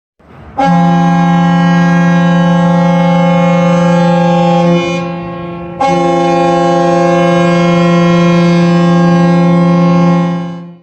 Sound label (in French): Cornes Brume; Corsica Linea